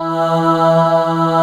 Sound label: atmo